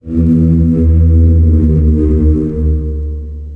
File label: BASS